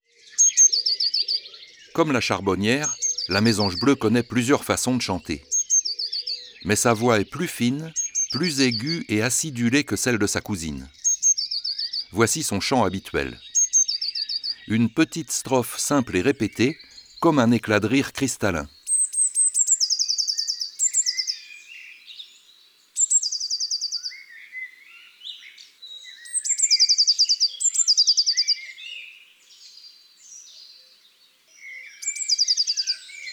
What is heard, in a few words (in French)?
Mesange bleue, Oiseaux des jardins